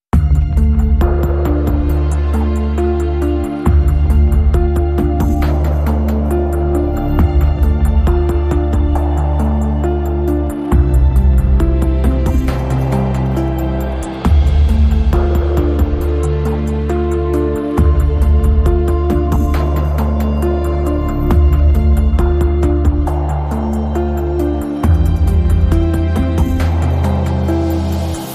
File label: No Piano